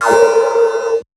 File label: Hats, Alesis Performance-Pad